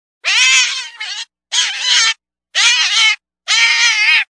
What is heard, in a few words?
Red Fox Pup